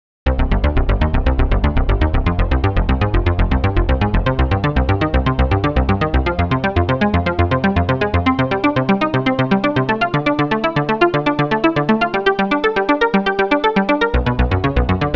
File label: elastic trigger